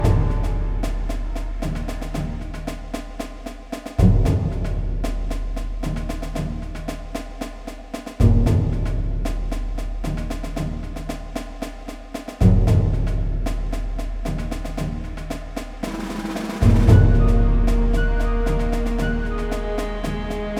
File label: Tension Rising Loop; Navyblue and the Spectrum Killers